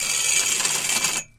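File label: sounds of chains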